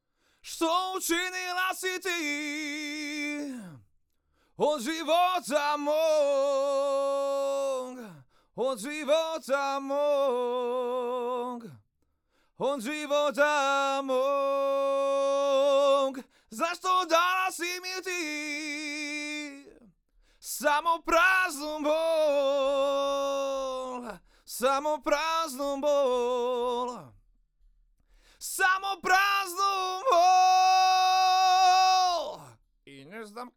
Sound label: AKG Cardio; Reaper project